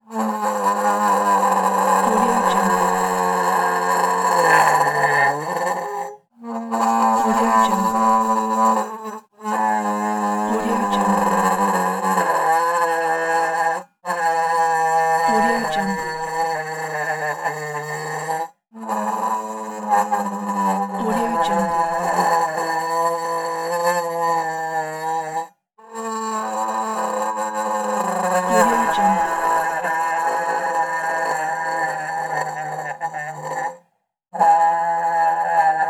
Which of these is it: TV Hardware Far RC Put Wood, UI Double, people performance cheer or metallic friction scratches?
metallic friction scratches